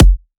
drum hit finish; osu!

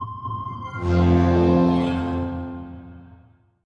os startup; steam

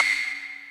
normal hit whistle; osu!

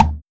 drum hit clap; osu!

